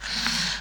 モノを引く

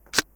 Kスライド出し

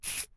キンチョール

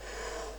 モノを引く

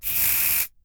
キンチョール

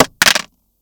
もの落とす

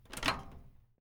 電子レンジドア開閉

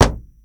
壁を叩く

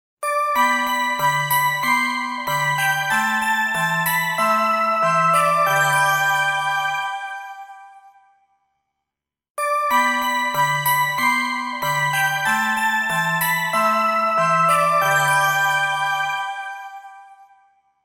発車メロディー; trainmelody

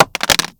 もの落とす

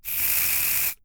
キンチョール

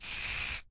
キンチョール

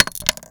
もの落とす

